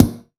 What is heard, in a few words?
KICK, AMBIENCE KIT; AKAI S6000 CD-ROM - Volume 1; DRUM RYTHM